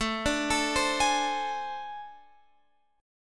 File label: A Minor Major 7th Double Flat 5th, down, Guitar, Open F; AmM7bb5; arpeggiated